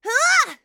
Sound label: Mage Grunt Physical; Realm Royale; Starburst Sorceress